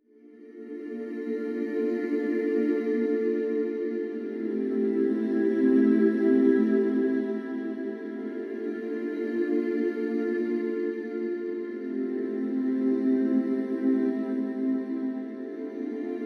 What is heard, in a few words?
piano version